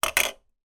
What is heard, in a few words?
leisure toy plastic recorder down on table